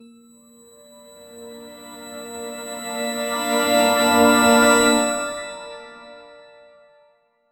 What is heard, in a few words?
a whimsical and cinematic; film